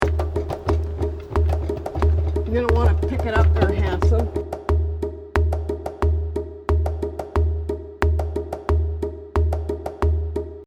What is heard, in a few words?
bongo slow 90bpm